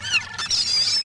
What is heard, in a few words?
dolphin; PC Play and Learn